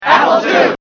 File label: apple2